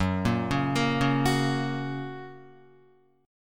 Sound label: Gb Major, Guitar; Gb arpeggiated; JGuitar